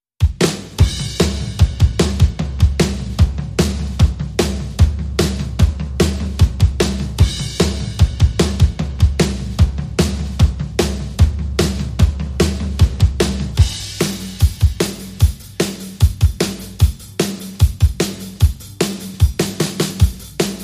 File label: Drums Only